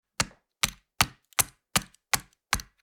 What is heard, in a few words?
Metal miniature game board map moving